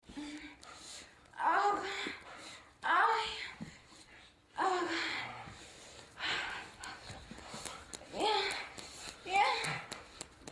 sex, moans; The Witcher Hunt

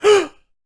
Gasp; Treasure of Nadia

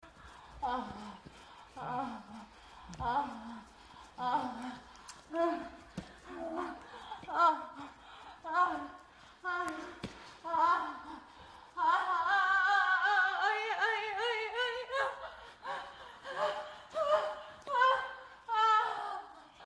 sex, moans; The Witcher Hunt

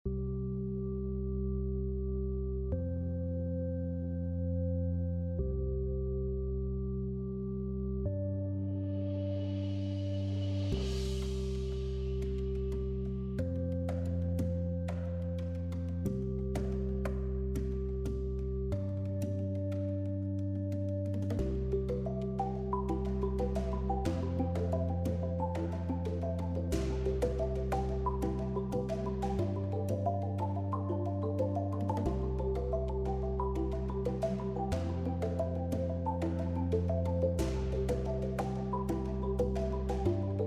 romantic; The Witcher Hunt

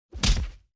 punch; The Witcher Hunt

